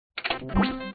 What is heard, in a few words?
start; hackthelobby